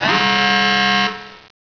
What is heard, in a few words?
alarm; XDM; ambience